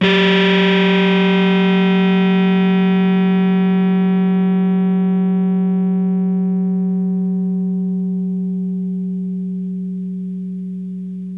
rez rhodes

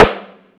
processed snare, Deep House; Zero-G Deep House; single hits

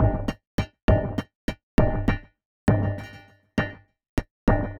perc 100, Percussive Loops, 100 bpm

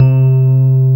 Rhodes; Best Service Gigapack 1

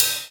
RIDE, House Side Of 2 Step; USB Soundscan vol.51; AKAI

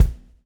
SWNG

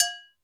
Lo Muted, Alesis D4 drum machine

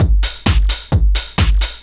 straight, Techno Trance Essentials drum loops

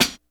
hi-hat, Hip Hop DRUM; InVision Interactive; 40 Oz Phat Slammin Hip Hop

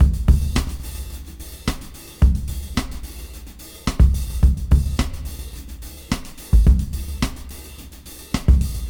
Rap Dry 106 BPM Rev; AMG - Keith LeBlanc Phat Butt Jams

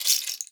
RAIN, PERC; Xsample VOL-9

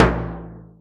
Surdo, Drum Machines Roland R-5

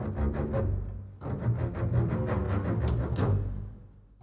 Bass, Orchestral; Sonic Foundry (Sony Creative Software); Orchestral VOL-2; Modern 09

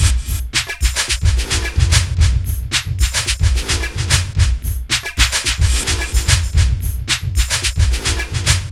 Industrial Loops, UZINE LP

